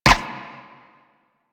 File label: ricochet; Warframe; Cowgirl